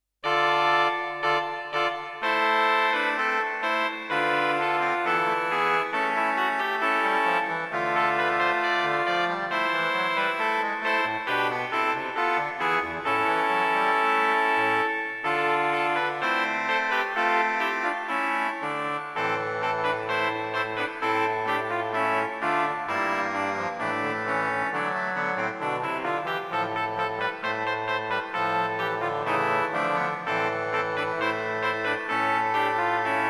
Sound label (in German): Blockorgeln; Scheidt